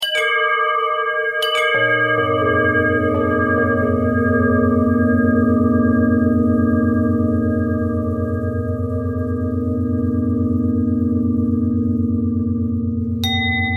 bell bendtwist

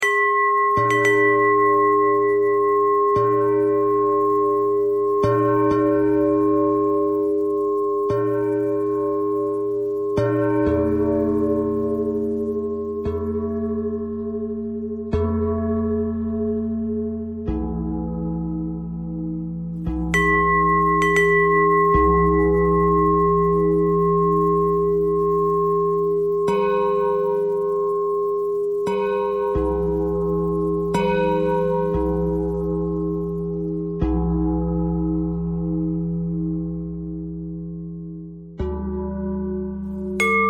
bell bell thread noise

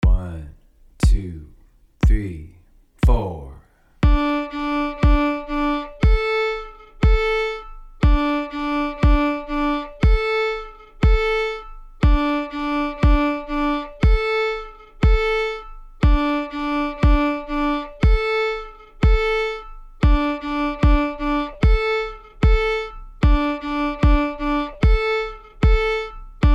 string crossing tucka split D to A, fiddle

